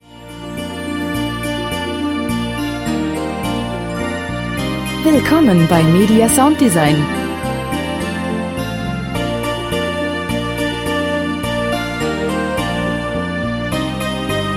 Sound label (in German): weihnachten jingle bells